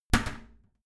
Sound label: toilet lid slam heavy; haus-garten; badezimmer